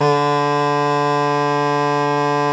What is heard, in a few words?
BASS SHAWM; SoundBytes - Early Patches